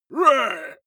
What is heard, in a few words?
male shout hit